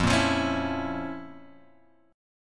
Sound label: F Major 7th Suspended 4th Sharp 5th F; FM7sus4#5 Chord {1 1 3 X 2 0} strummed